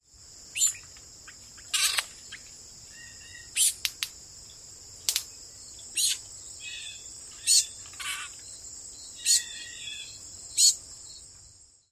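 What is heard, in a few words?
various calls and bill snaps, Red-eyed Vireo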